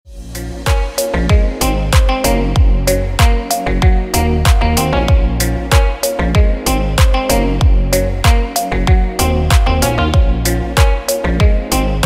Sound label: call sound